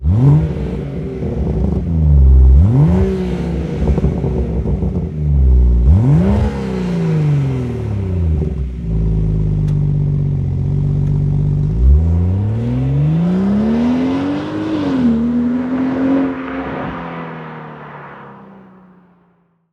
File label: Slip On BMW M240i